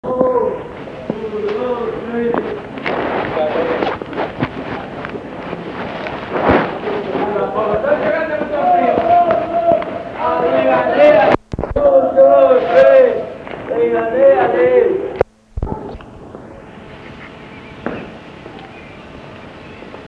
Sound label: shrine dictorphone mexico, street recording